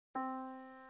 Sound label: yamaha Disk